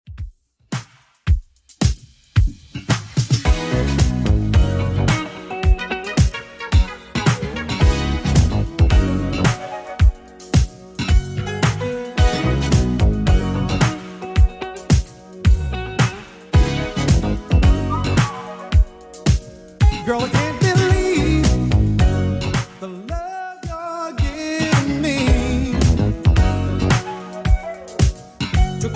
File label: 80s Redrum 110 bpm